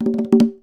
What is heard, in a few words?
Fill, Conga; musicradar